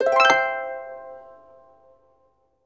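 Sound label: harp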